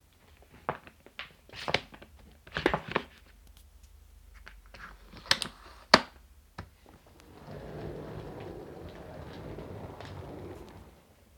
Rolling Suitcase

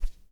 Arrow

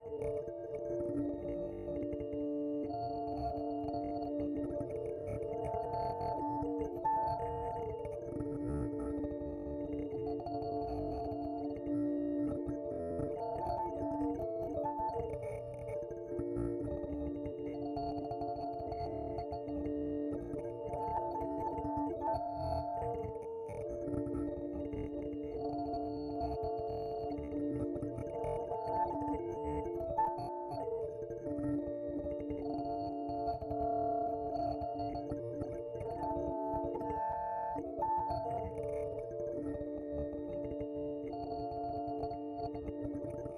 Ambience Musical Bells Glitch

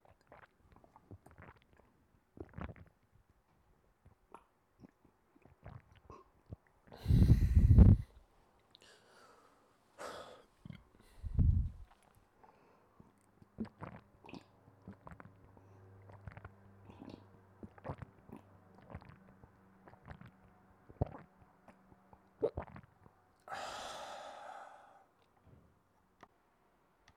Drinking Water

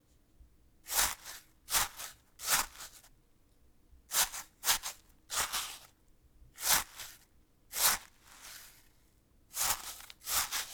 Digging In Wet Course Sand

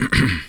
"Ahem"

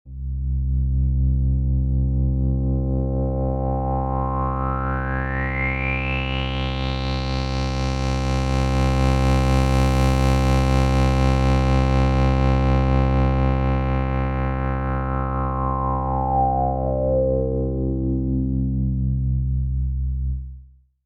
Analog Drone

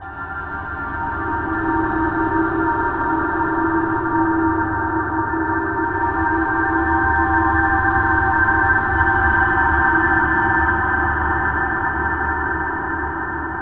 Dronescape

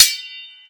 sword

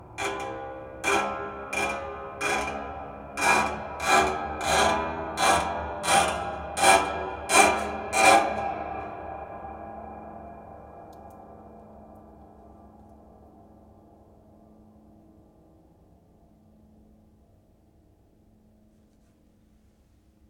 Scrapes

